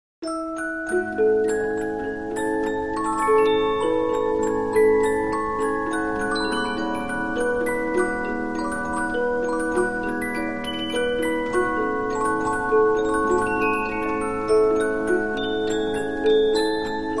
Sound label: Polyphon, 15inch disc musicbox